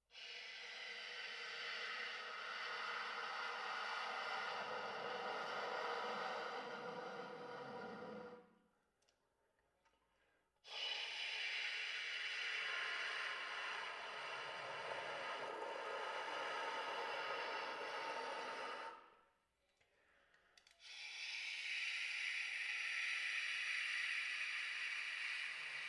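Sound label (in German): sop, Luft-Ton, Übergang von hell nach dunkel auf drei Tönen: tief, mittel, hoch; »Tonleitern tonlos«; Übergang Luft -Ton auf einzelnen Tönen. Sopran; saxophon; spieltechniken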